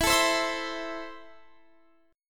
E Suspended 4th Sharp 5th

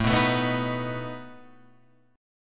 A Suspended 2nd Flat 5th A down Guitar Open F; Asus2b5 strummed

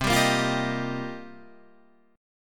C Minor 11th C Guitar Standard; strummed; JGuitar

